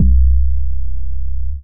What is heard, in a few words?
Deep Pure Sub, Analog Bass; Ableton; Factory Packs; Synth Essentials